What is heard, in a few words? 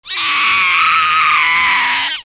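RAT death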